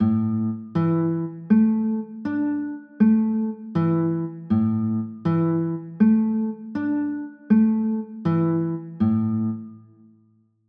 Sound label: a sus4, chords